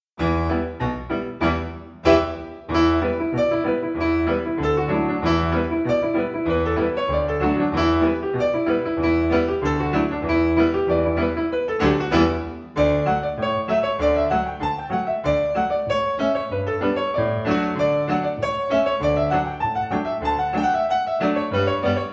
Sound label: warmup jumps, traditional reel; drowsy maggie